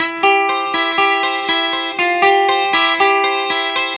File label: BYRDY RIF 1 C, Vintage Blues Guitar, 120; USB Soundscan vol.22; AKAI